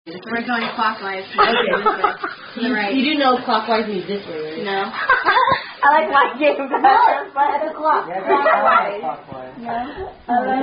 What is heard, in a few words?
Table Thumping Non-Drinking Game; Thumper; Unknown Table Thumping Non-Drinking Game